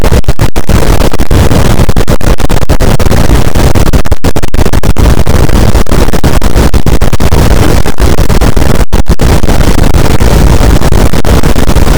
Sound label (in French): cachalot negatif, boat, Physeter Macrocephalus